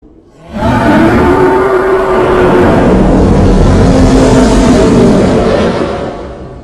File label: minotaur roar